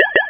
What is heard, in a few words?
palmtop